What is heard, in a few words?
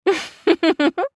laugh; Eternal Return; Hisui; JPN